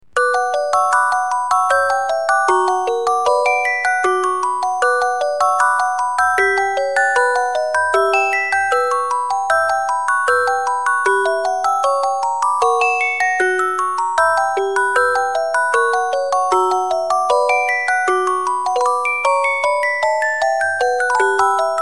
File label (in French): reuge danse hongroise, boite a musique